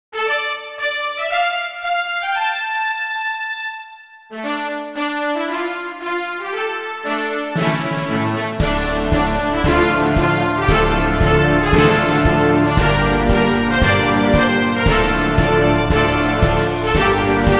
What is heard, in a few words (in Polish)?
Szara Piechota melodia